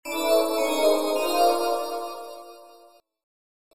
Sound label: accept melody